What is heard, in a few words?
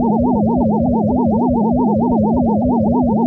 Sci-fi Hover Bot Loop; SFX Kit; Sci-Fi Loops